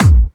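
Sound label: BD Clubby, bass drum; VEC1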